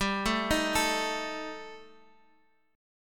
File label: G Suspended 2nd Sharp 5th, Guitar Drop D; chordshape; down